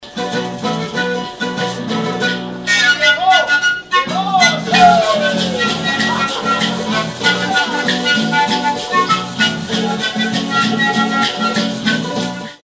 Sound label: flutes guitars in peru